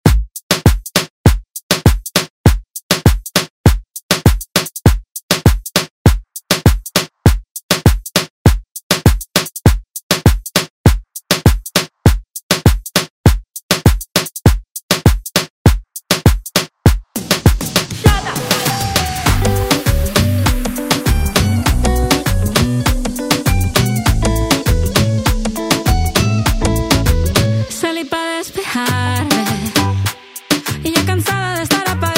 Hit Latin Pop Redrum; Deejay Hit

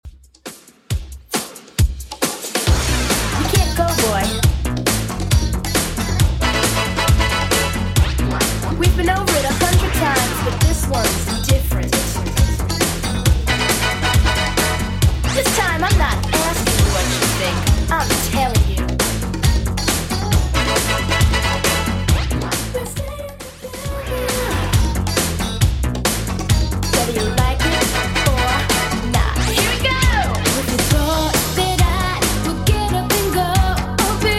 80s Redrum, 136 bpm

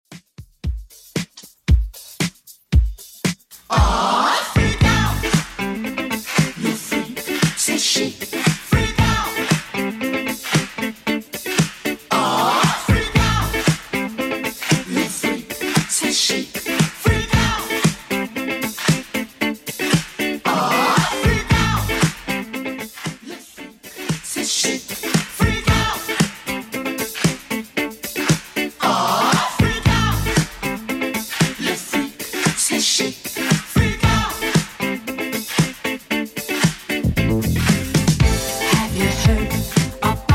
80s Redrum 115bpm CLEAN; 70s

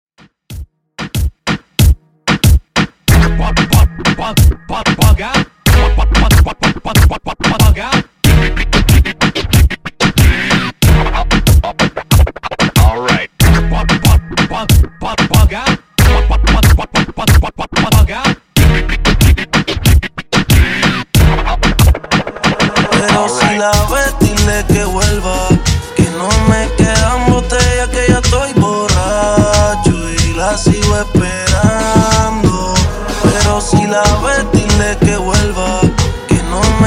Funk Scratch Redrum 93 Bpm; Clean Dirty

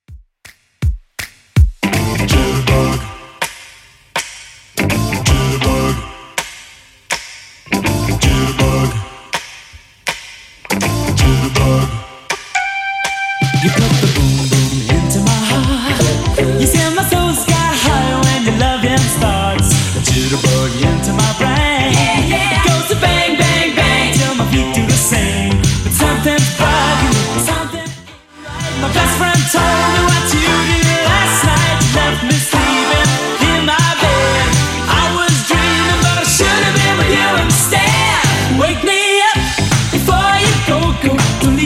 80s Redrum 162 bpm

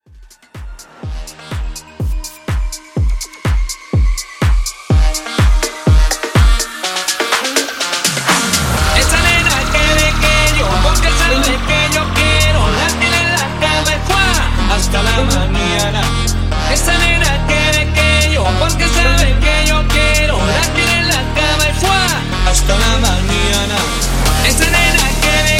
Mashup

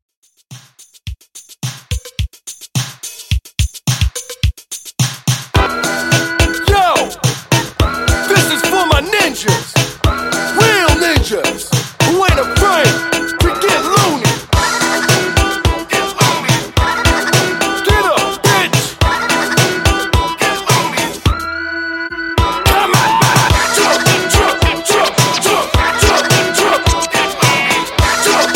Old School Redrum 107Bpm

